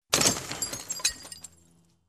Scrap Metal being trashed

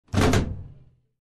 Opening Steel locker

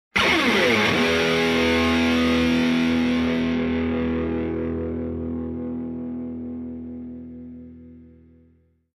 Heavy rock guitar chord

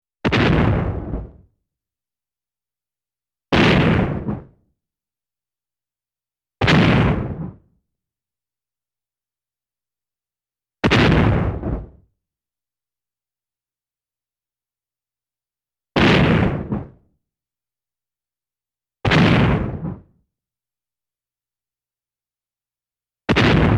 Missile Explosion

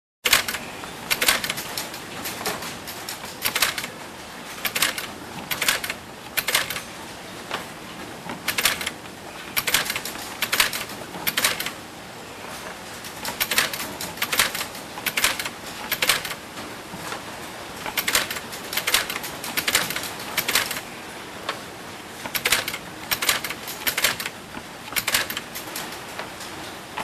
Manufacturing Machine Running

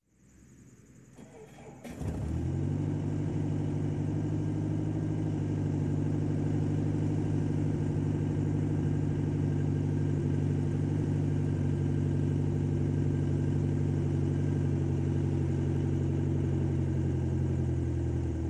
Helicopter engine start and take off

